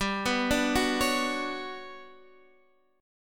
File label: G Major Sharp 11th, Guitar, Drop D; chordshape; down